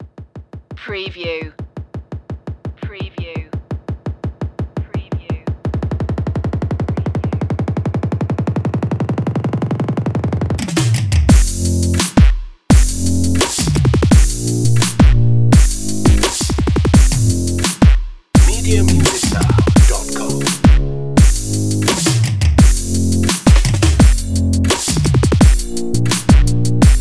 bass and drums

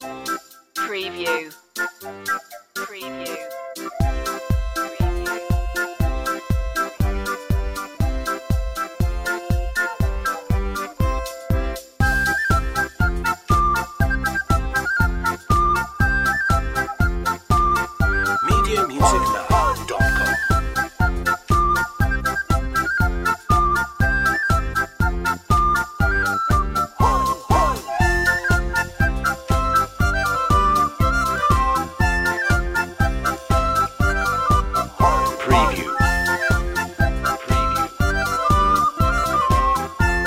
merry polka

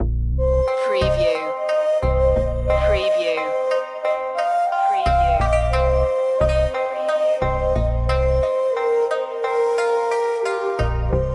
hong kong diner no drums

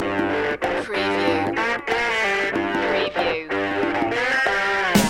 beats loop

